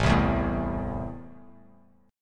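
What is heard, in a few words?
A Minor, chord strum; Am strummed; JGuitar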